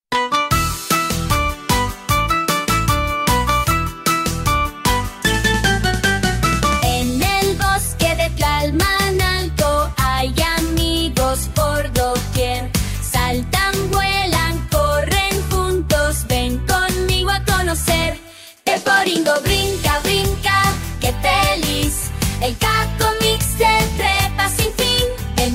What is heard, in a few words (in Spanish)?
Bosque Alegre (Version Kids)